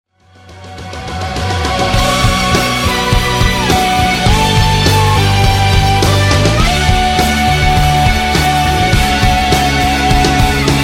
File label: violinist